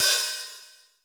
JAZZ OH, JAZZ KITS; Crypton; DrumBase5000

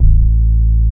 DR. LOOP, BASS; X-Static Goldmine 3

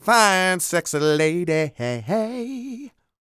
SING, Vocal Planet R&B & Dance; ILIO

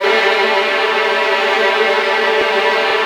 Virtuoso Strings; ILIO; Kirk Hunter Virtuoso Strings VOL-3; 90 s Sample CDs

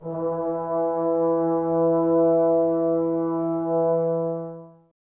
BRS TBS E, Woodwind Brass Ensemble, Brass; Miroslav Vitous - Woodwind Brass Ensemble

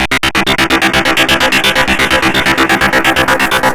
GRUNGE

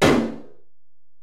Tom, Alesis DM-PRO, Drum Machines, Toms

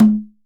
EMELABO HITS; Best Service; World Colours

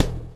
blow; Spectrasonics; Metamorphosis

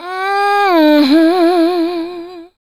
VOX, Singin

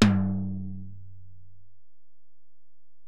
Tom, Alesis DM-PRO

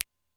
Finger Snap, Alesis D4 drum machine

